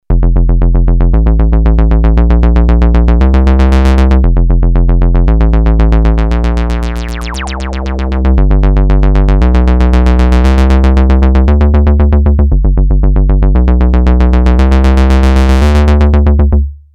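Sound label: bass bassline, Moog Minitaur Bass Synthesizer; analog monophonic synthesizer; 2 VCO oscillator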